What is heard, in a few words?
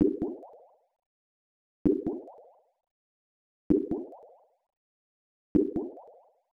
Drips; Dracula Hakushaku; X68000